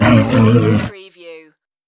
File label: creature vocal